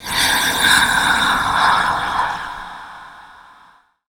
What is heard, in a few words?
VOICE FX, Dance Vocals